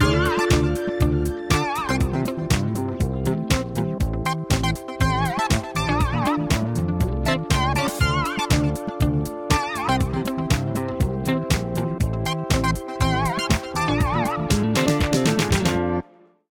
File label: Roller Disco, ringtones, Retro; Samsung Galaxy S24